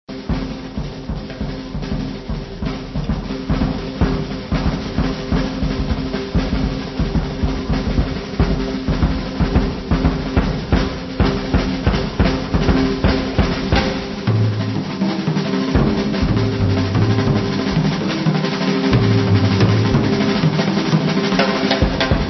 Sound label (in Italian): assolo